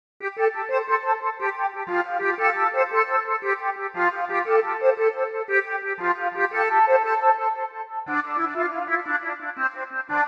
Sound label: Flute interlude